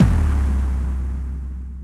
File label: Long Bass